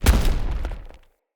Heavy Melee Charge Step

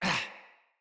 Attack, Battle Barks; Envoy

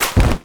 Deployable Cover Destroy; Gameplay; Warframe; Tau; Destructible Dynamic

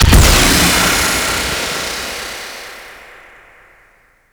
Fueling Station Final Explosion; Warframe; Corpus; Railjack

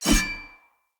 Sword Whoosh; Weapons; Player; Swords; Fenn Jotar Sword

